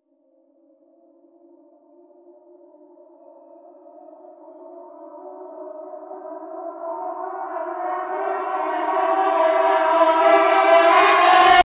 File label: Rise Short